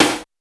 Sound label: Dry Set; Roland U220